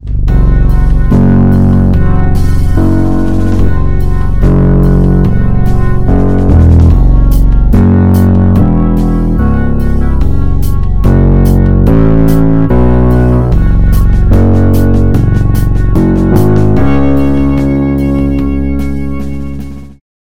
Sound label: bass gritty